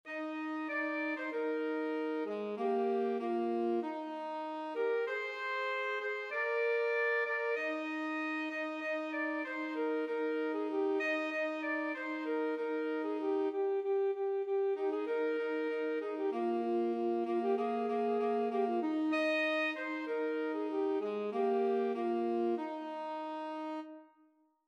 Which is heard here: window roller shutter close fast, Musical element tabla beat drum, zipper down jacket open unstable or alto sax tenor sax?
alto sax tenor sax